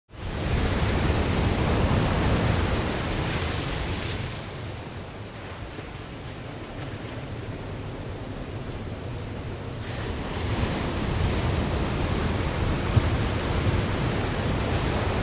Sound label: Waves Large Crashin